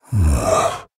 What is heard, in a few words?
Nonverbal Sigh; Ornn